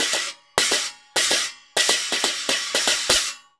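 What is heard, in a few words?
CHINA, China cymbal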